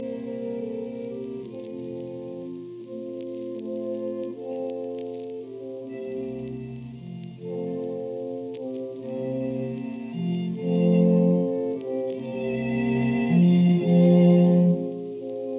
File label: Octet in F major Adagio Allegro; Schubert